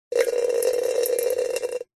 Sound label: nature water drips sudden short metal container small